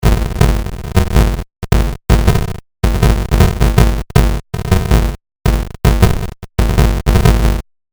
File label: BitCrusher